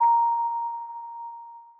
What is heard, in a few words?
ding